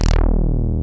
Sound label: DRY BASS; Masterbits; Dance Trax